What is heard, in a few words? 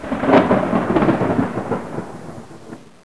THUNDER; Sonic R; Windows